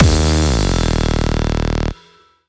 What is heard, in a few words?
Music Dance End; game rip; littlest pet shop city friends beach friends country friends